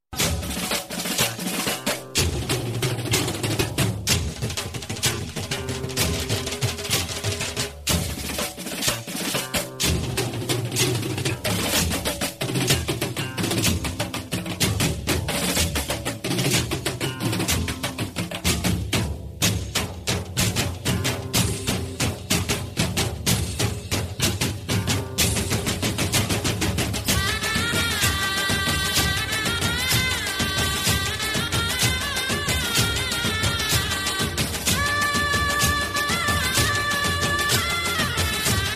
Gujarati Garba

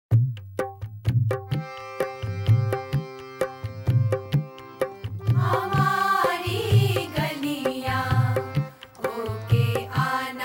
(Sad); Raincoat

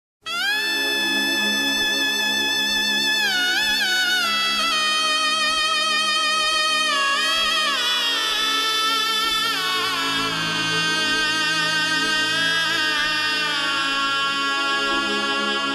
Sad; Ghulam-E-Musthafa

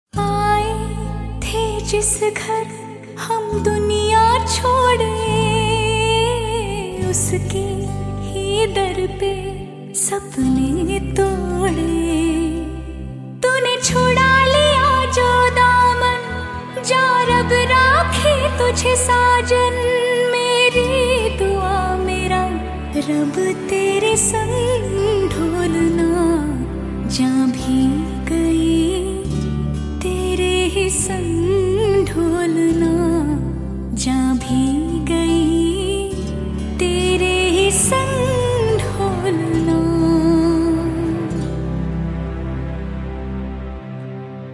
(Sad Version); Dulha Mil Gaya

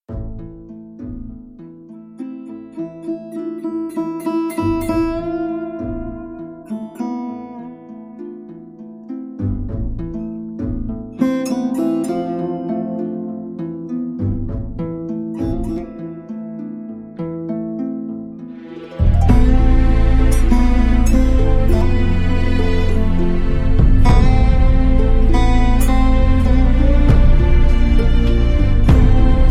(Instrumental Version)

